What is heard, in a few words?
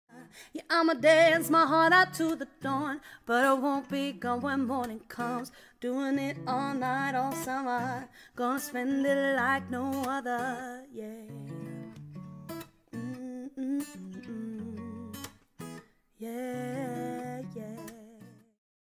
Acoustic Duo Cover